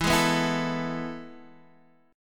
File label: E Major Add 11th E, chord; E Add11 strummed; JGuitar